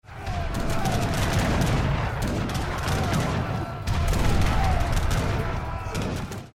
Ground Battle; Age of Empires III; cinematics

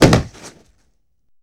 Body Fall On Dirt, Hand To Hand Combat; Sony Pictures Sound Effects Series

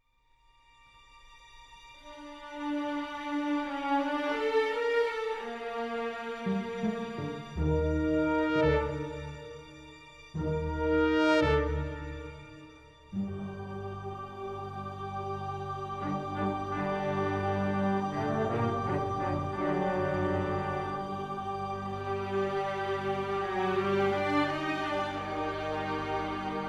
Music

